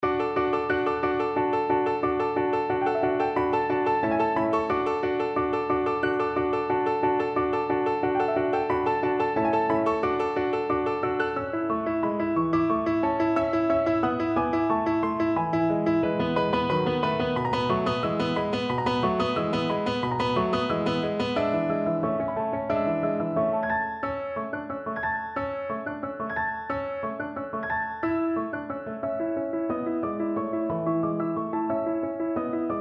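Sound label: viola karaoke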